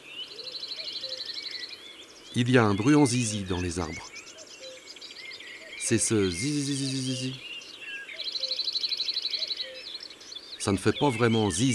Bruant zizi, chants d oiseaux; ABC des chants d'oiseaux

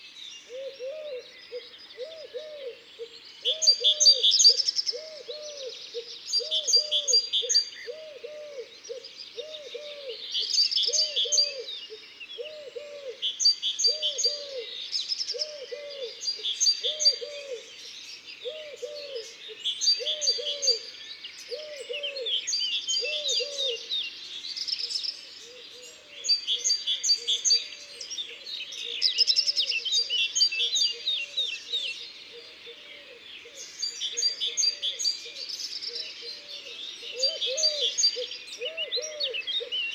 Tourterelle turque; Isere; Concerts naturels de l'Isere; Studio Les Trois Becs

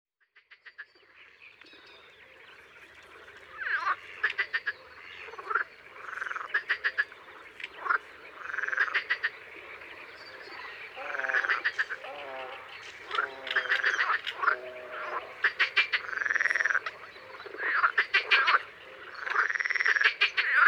Grenouilles vertes rieuses; Isere; Concerts naturels de l'Isere; Studio Les Trois Becs

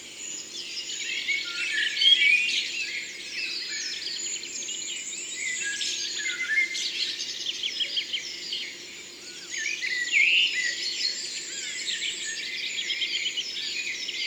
Merle noir; Concerts Naturels de la Drome; Studio les Trois Becs

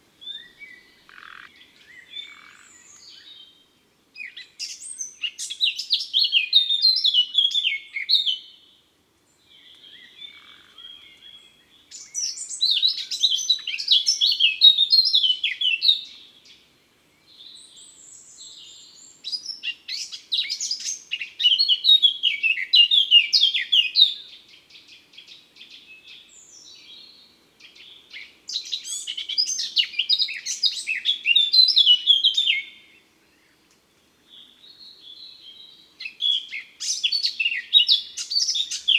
Rossignol Cris Concerts Naturels De l Ardeche

